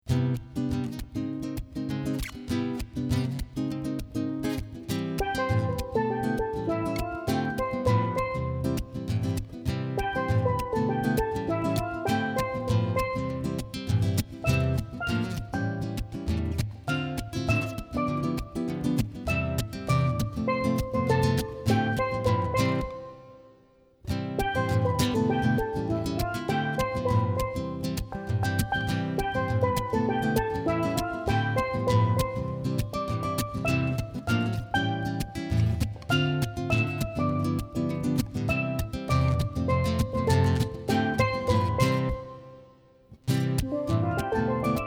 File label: smooth jazz duo, Steel Drum